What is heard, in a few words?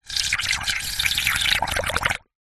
food drink bubbles blown through straw into water in plastic cup; zapsplat